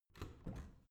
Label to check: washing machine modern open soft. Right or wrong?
Right